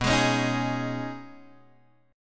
B 6th Add 9th B down Guitar Open G

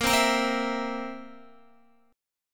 A sharp Suspended 2nd Flat 5th, Guitar; strummed; JGuitar; x,13,12,15,12,12

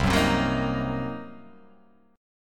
C Minor 7th Flat 5th, chord

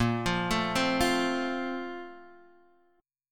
Bb Major 7th Suspended 2nd, Guitar, Open D; x,1,3,3,3,3

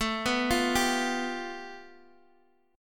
A 7th Suspended 2nd Sharp 5th, Guitar, Open D; x,x,7,5,8,5

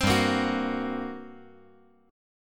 G Minor Major 7th Double Flat 5th, G

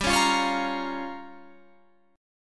A sharp Minor 6th G

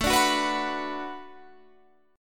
B-Minor

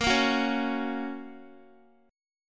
Bb Minor Sharp 5th Bb down Guitar; Bbm#5 strummed

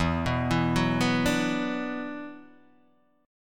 E 7th Suspended 2nd, down, Guitar, Open D

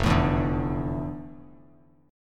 F Major 13th Bass Standard; strummed; JGuitar

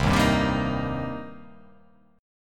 C Minor 7th C down

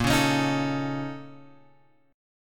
A sharp Minor Major 11th; {6 4 X 6 4 5} strummed

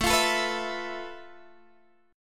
F Major 7th Flat 5th A, guitar chord

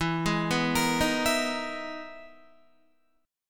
E-Major Sharp 11th E, Guitar; EM#11 arpeggiated

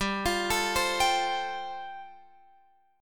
G 7th Suspended 2nd Suspended 4th, Guitar Open F; G7sus2sus4 arpeggiated

